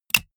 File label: click